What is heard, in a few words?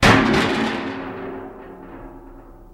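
sounds of barrel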